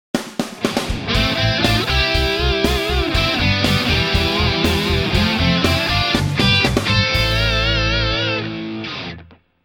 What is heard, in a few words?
3rd harmony